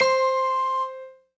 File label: Bell Ding